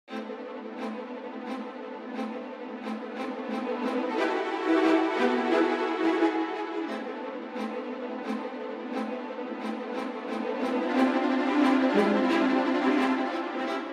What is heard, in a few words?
strings